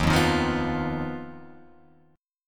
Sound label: Eb Suspended 2nd Sharp 5th, Guitar, Open F; Ebsus2#5 strummed; JGuitar